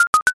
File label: chip